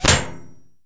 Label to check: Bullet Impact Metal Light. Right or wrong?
Right